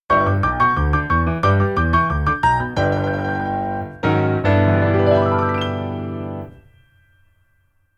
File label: boogie woogie logo